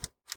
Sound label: CD Tray Close; This Is the Police